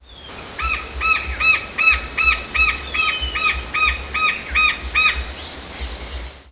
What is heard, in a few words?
Colaptes melanochloros; Guarau; Peruibe; SP; Brazil